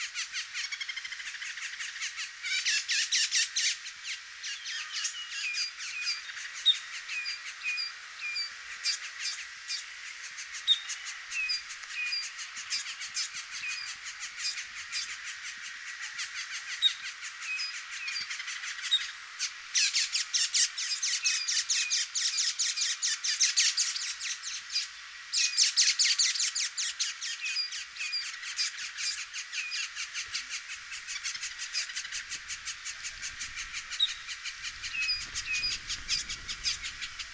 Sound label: Northern mockingbird